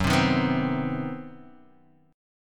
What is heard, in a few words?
C Minor Major 7th Flat 5th Gb, chord